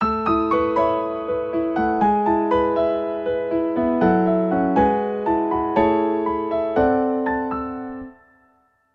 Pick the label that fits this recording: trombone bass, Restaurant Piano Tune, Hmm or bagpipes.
Restaurant Piano Tune